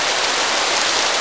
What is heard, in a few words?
river; WhiteOut